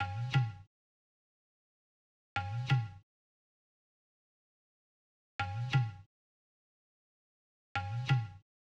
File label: Tabla, 089 Bmin, Hip Hop Exotica